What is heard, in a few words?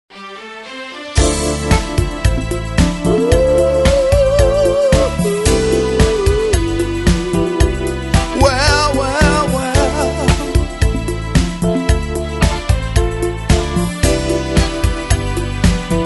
Old School Music Mood